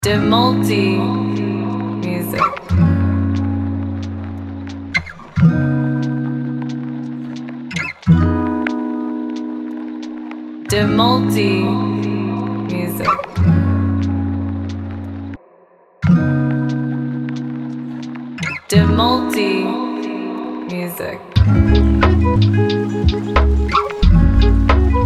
lofi

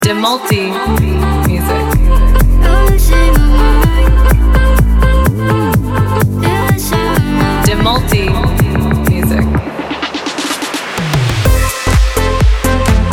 edm, house music

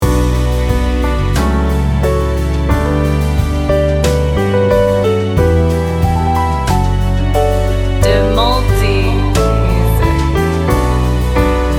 instrumental pop

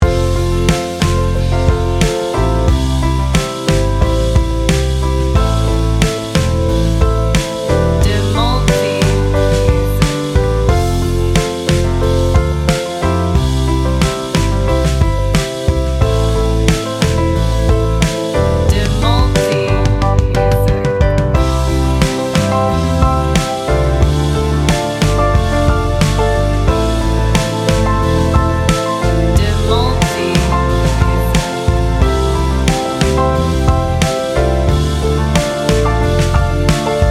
instrumental ballad; Meski lembut, lagu ini memberi ritme yang membuat langkah terasa ringan.

